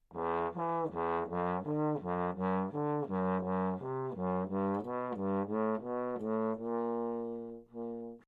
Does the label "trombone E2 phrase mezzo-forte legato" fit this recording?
Yes